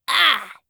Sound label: ouch girl; spiritheroes